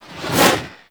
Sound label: Leap Whoosh; Warframe; Venus; Venus Terra Moa; Abilities